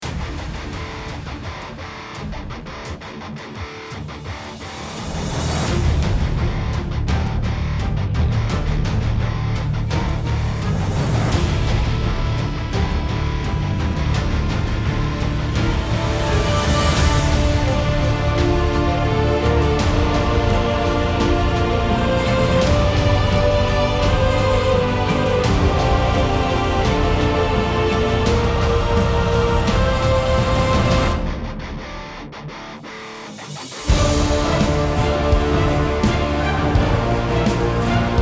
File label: raid battle, bgm